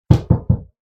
外側からを壁を叩く音

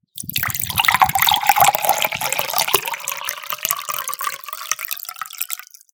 缶の飲み物をグラスに注ぐ音

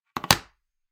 リモコンを置く音

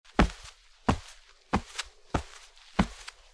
畳の上を歩く音; walk

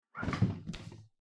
冷蔵庫の扉を開ける音

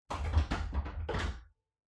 扉ガチャガチャ

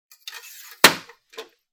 包丁をしまう音

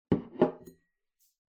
グラスを置く音

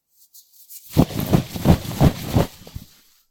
布をバサバサする音

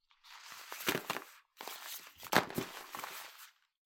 ゴム手袋を置く音

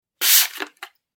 炭酸のプシュ音

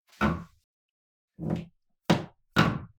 フローリングがきしむ音

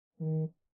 ベッドの上のスマホがバイブ鳴ある音

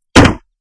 車のトランクを勢いよく閉める音

